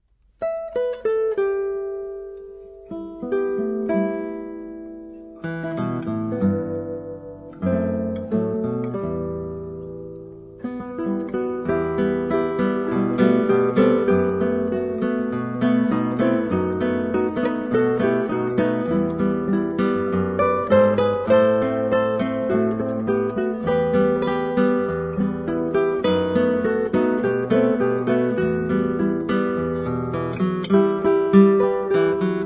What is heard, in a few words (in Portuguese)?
guitarra classica